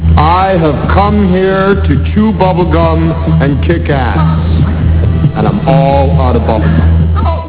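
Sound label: bubble gum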